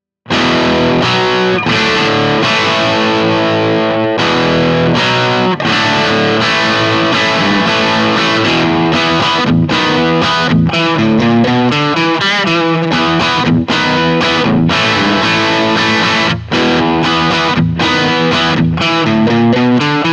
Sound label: rock chords; jensentone